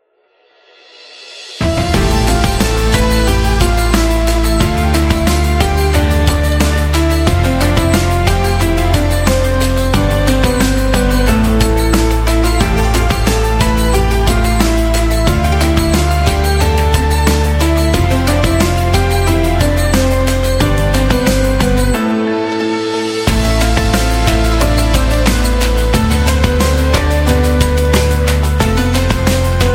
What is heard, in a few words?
Islamic